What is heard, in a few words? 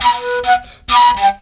FLUTE GRV; Best Service; World Colours